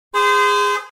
horns

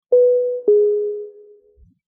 airdrop

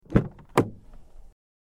car door Open

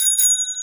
bike, horns

